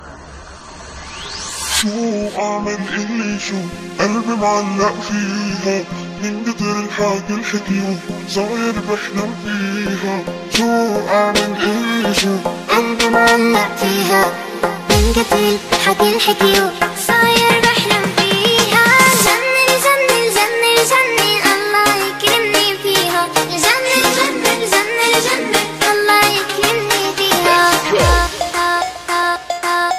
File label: Arabic Remix Ringtone